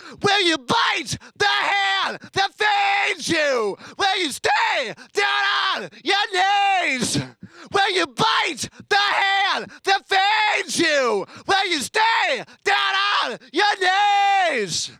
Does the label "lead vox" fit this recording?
Yes